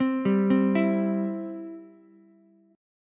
E Minor Sharp 5th E down Ukulele; Em#5 arpeggiated; JGuitar

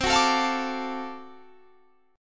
D 7th Sharp 9th C, chord

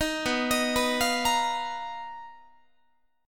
B Major 7th Flat 5th B down Guitar; arpeggiated

